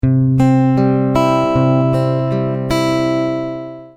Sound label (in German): zupf